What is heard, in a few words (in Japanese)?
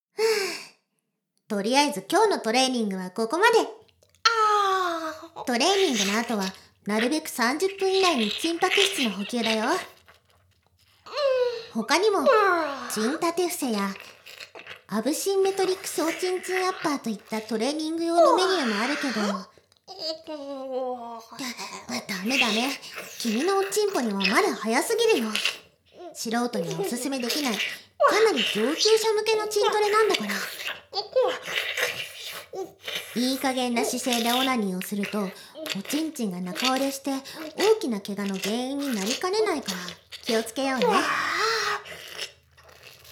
BGMなし お掃除フェラ; 魔法少女マスカリマッスル; トレーニング後には…お掃除フェラ♪